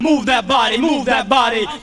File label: VOX SUNG; Megabass Remix! 2